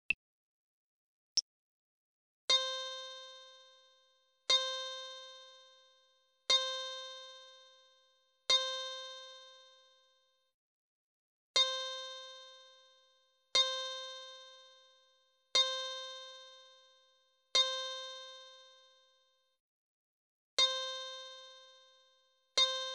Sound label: c guitar